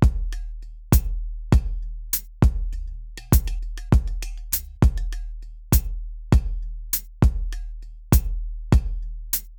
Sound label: Drums Track